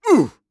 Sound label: Assassin Grunt Hit; Six-Shot Cyborg; Realm Royale